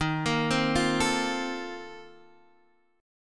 Bb Major 7th Suspended 2nd Suspended 4th

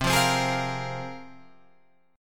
C 7th Suspended 4th Sharp 5th, Guitar, Open F; C7sus4#5 strummed

